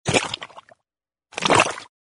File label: comedy mud puddle